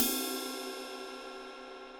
20 inch crash ride ping; Dream; bliss; cymbal

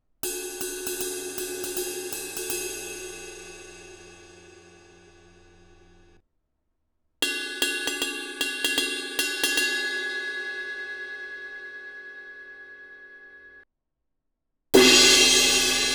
24 inch giant beat ride ride bell crash, cymbal; Paiste

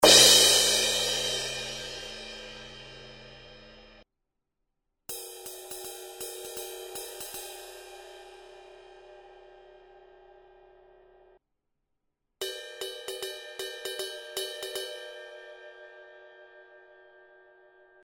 18 inch medium thin crash crash ride bell, cymbal; Zildjian

